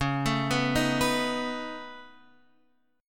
Db 7th Suspended 2nd Sharp 5th Db, down, Guitar Open F; arpeggiated; JGuitar